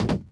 footstep jump metal

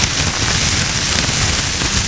rough track bush; tree

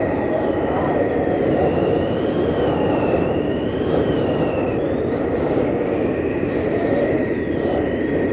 good wind; Sid Meiers Pirates; XBOX

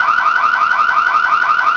Alarm; Project Gotham Racing

